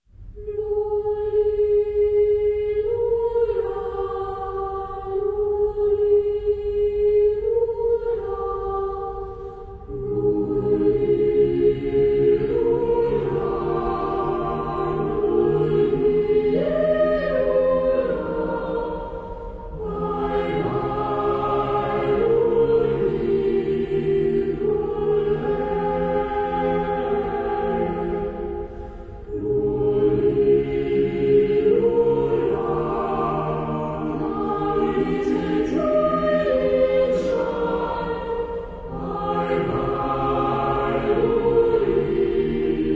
Cathedral Choir, Carol; Coventry Carol (Lully, Lulla, Lullay)

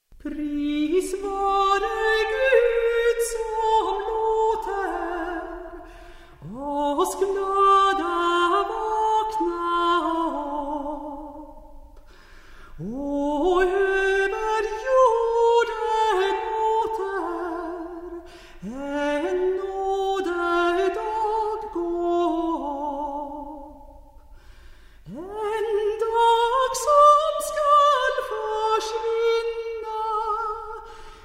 Traditional Swedish Folk Song; Pris vare Gud

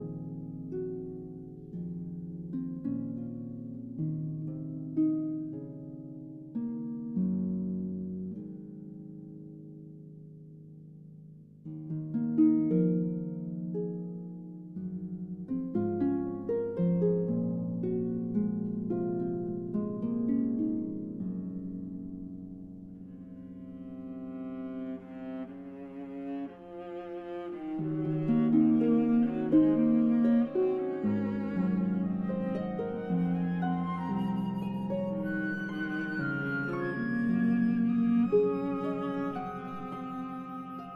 Harp Concerto Lullaby

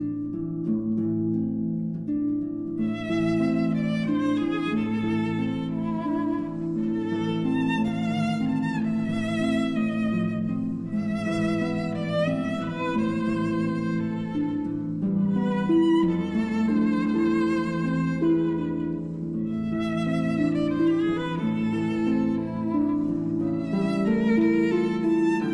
Berceuse, for violin and harp

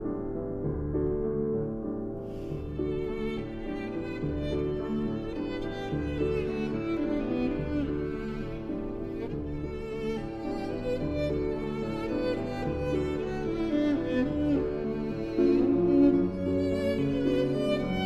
Violin and Piano in D; Faure, Gabriel; Berceuse; Opus 16

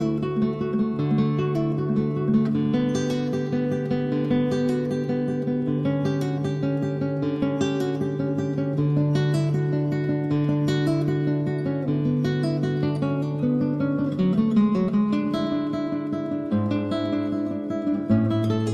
Cello Suite No. 1- Prelude

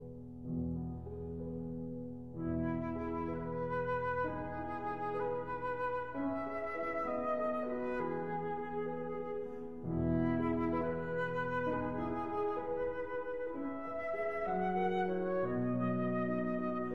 Berceuse (Lullaby) for flute and piano

